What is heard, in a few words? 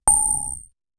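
coin dropping from videogame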